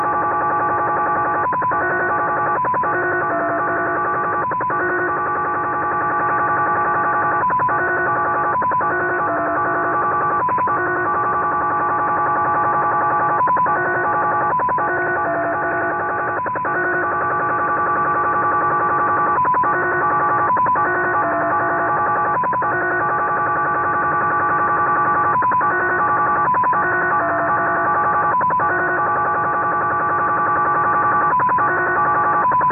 The Japanese Slot Machine, 4291 kHz, numbers stations